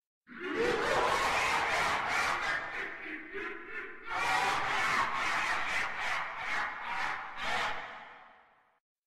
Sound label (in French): rire plus diabolique; flowey